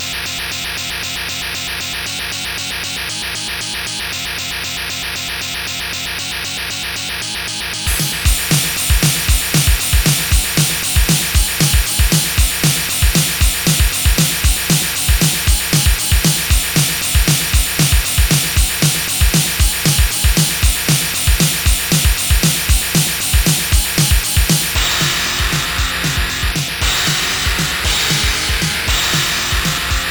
heavy metal; SoundFX 1.3; Amigaid

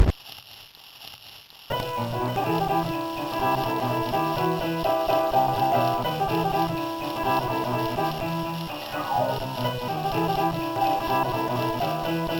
drunk piano; Trackers; Modland

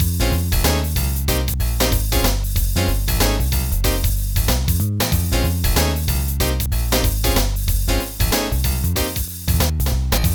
black jaska jazz

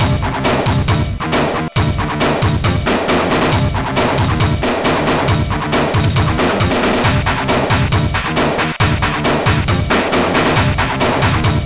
DRUMS MIX

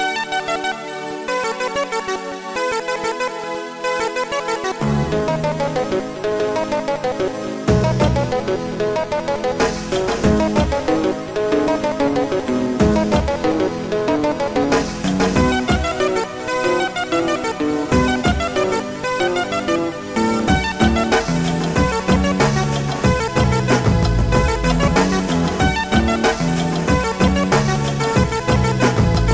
bass tuned; Trackers; Modland; MLB

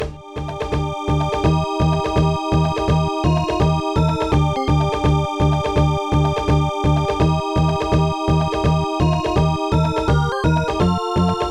jungle meditation i; MusicLine

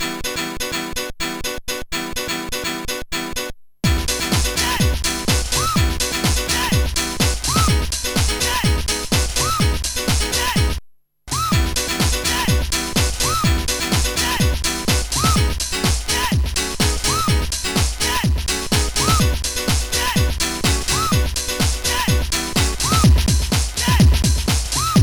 bassline; Trackers; Modland

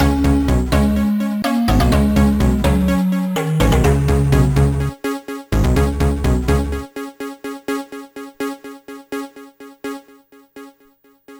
gameover; darkman; Trackers; Modland

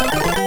jingles; superscramble

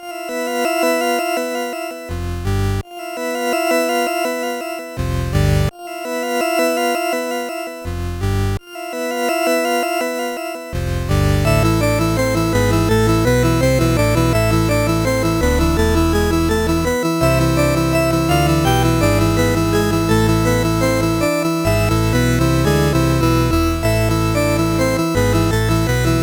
organ symphony; MusicLine; Firefox

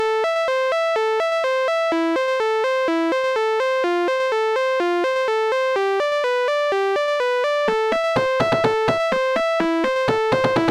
silent groove; MusicLine

